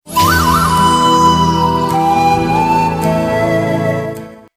western sms

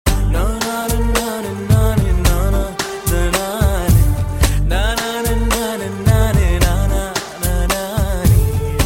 Nice Rythm

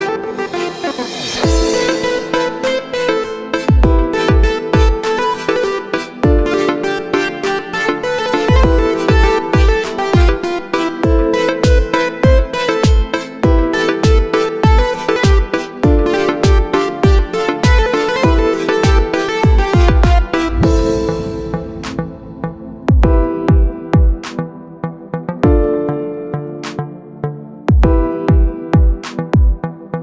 Azeri Bass Music

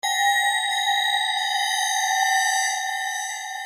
Space Sound

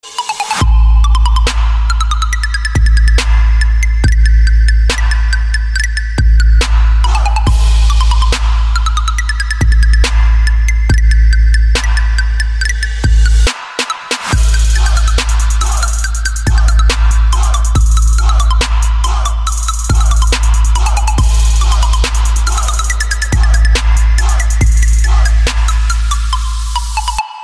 Mozart Turkish March

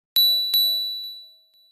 Message Bell S8

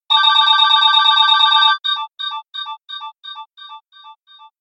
Low Nokia 3220

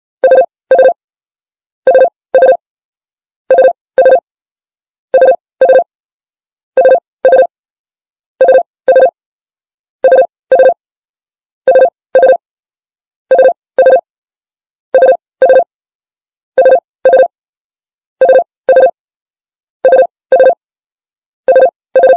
Classic Telephone

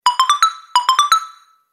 Message Pops

